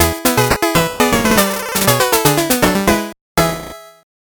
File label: 8bit jingle slow